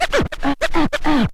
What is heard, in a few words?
scratch kit, vinyl